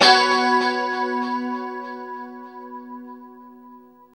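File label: chord B 4, guitars; Spectrasonics; Hans Zimmer Guitars VOL-1; JC-SHEEN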